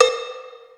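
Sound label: Cowbell, Yamaha SY22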